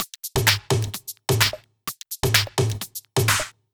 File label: Drumloops 128BPM